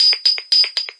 119 Found Percussion, Drum Loops and Breaks; 074 to 128 bpm

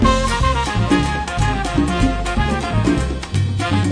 123 flute n horns latin; MELODIC LOOPS; SAMPLED MUSIC LOOPS

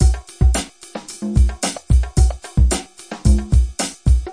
conga funk, 111 bpm

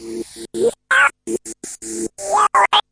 165 noise melody

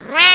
catcall; Weird and Interesting Hits; single hits

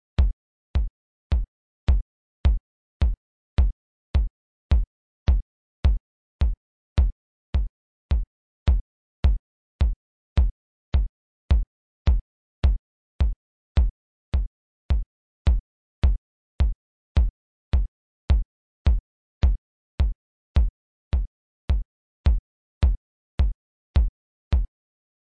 bleepy 4 4 kicks, 106bpm; bleepy mahem

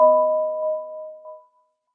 super pure round tone

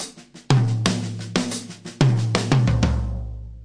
six eight Fill, DRUM LOOPS and BREAKS

